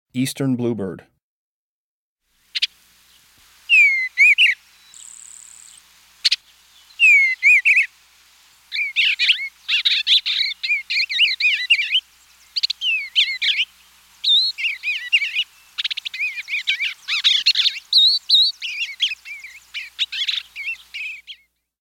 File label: Eastern Bluebird